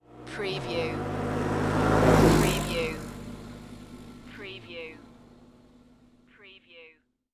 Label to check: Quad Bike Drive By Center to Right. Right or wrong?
Right